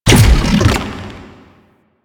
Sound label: Octopede Lash Limbs Beam Stop; Warframe; Man In The Wall